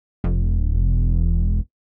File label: Bass, Hip Hop Exotica, 088 Emin